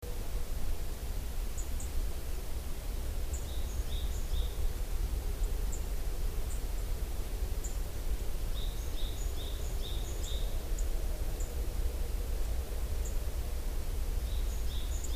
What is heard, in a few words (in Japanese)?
miyamaho